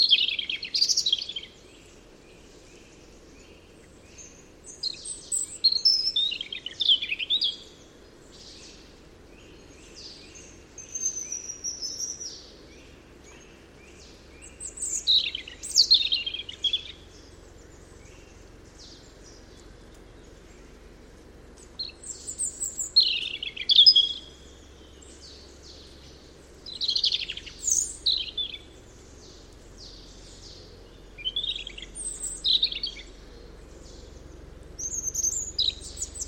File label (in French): rougegorge familier